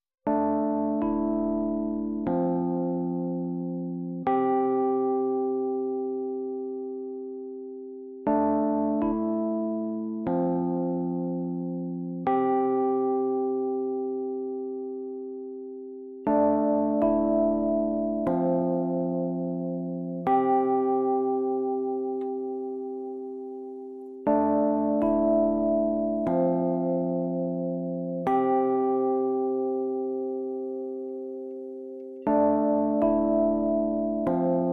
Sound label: Cyclic Notes Mix